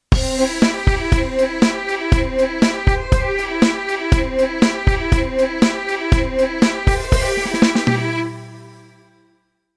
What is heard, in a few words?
MIDI Track Delay Strings